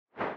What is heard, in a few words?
back, sfx; airbnb deck; hyperframes